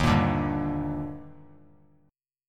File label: D sharp Major 7th, Bass Standard; strummed; JGuitar